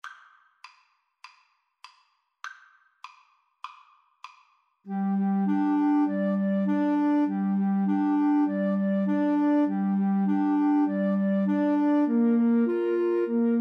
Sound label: Mountain King, 2 Flutes Clarinet